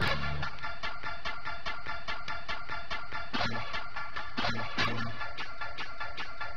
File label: horns, Loops Phonky